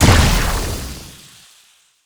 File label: poison hit; garrysmod